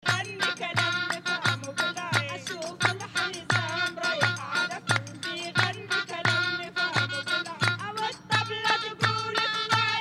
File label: Ghawazee dance